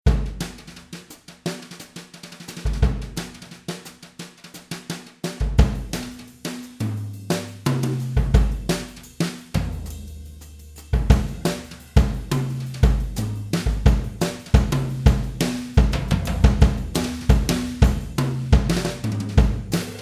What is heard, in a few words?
JAZZ BRUSHES GROOVE; AVATAR